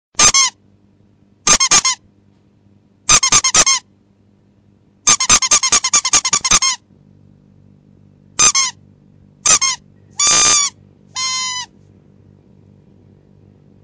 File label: Squeek Squeek